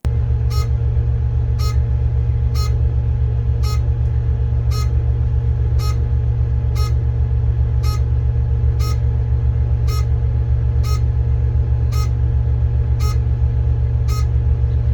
music

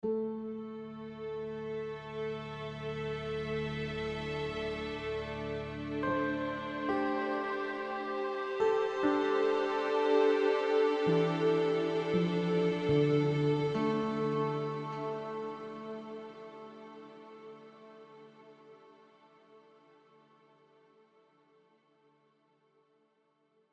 calm ambient

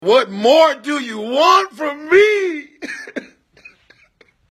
cry; tyrese

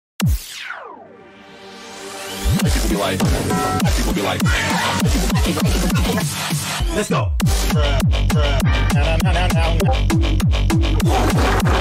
discord ringtone full version

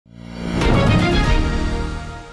Breaking News Transition Sound Effect

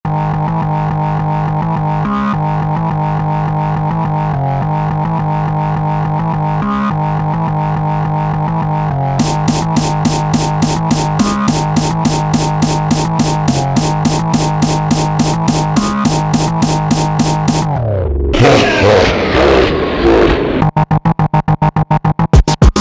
PHONK

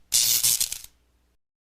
electric shock

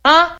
huh

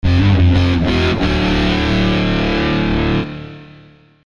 guitar distortion drop d rock riff; musical element; blastwavefx

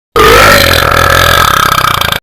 Burp earrape; Talking Ben

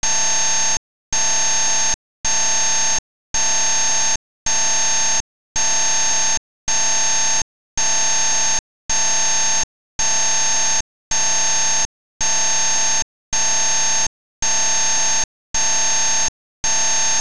Danger Alarm

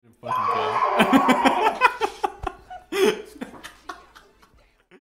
scream

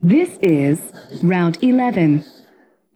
vocals

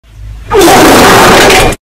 fart sound earrape

